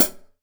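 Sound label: Hi-hat Acoustic closed; Acoustic and Electric; Polyend; Simulacrum Sounds; 1 Shots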